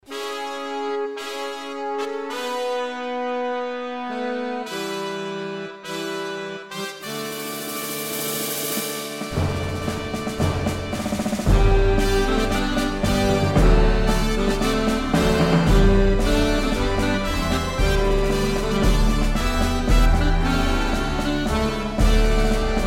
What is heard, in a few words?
adeste fideles beat